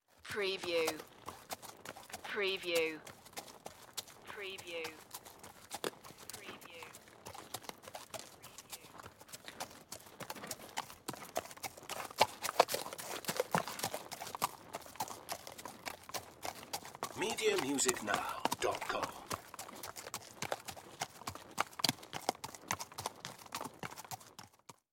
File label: Horse Walk Steady; Sound Effects Bible